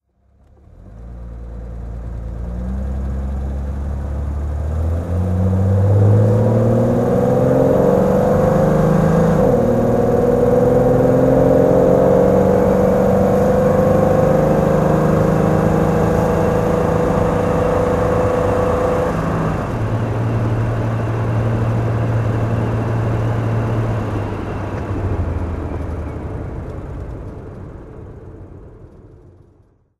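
jaguar e type S2 2+2 FHC automatic driving, Engine sound driving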